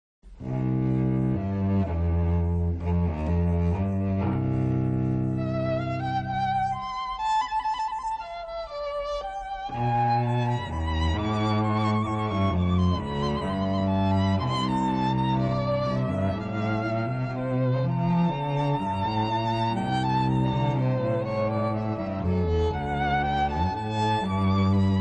String Quartet

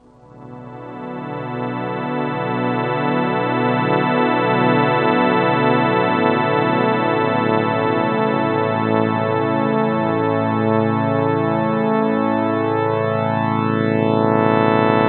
churn, Sruti Box; iPhone

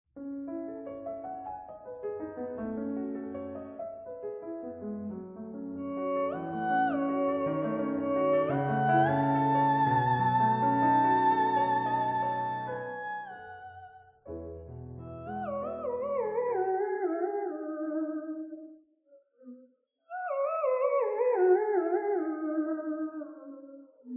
Spleeter Theremin Example

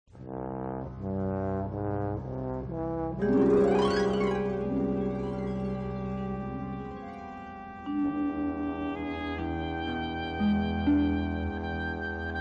Dreamy with Harp; Revlon

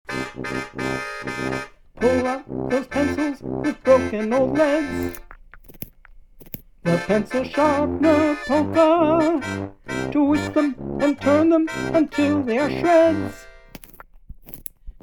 Pencil Sharpener Polka Tuba

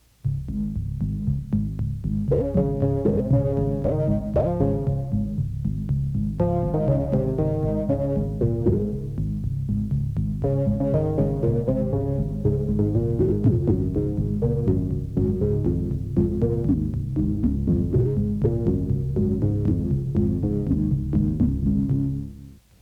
P600 vocal-like synth

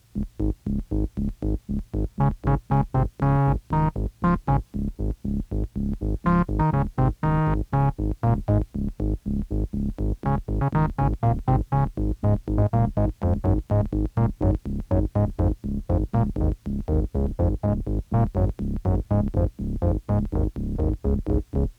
P600 4 organy

